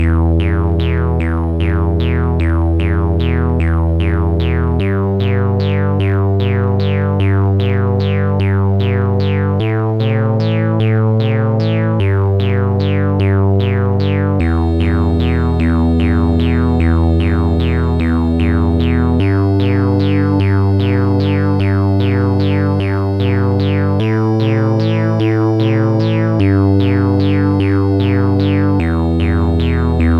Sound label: deep sea blues ii; MusicLine